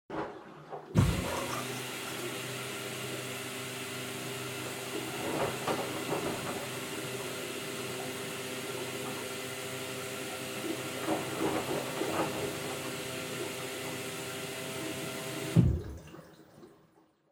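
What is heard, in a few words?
Washer Front Load Operation Noise Pour Water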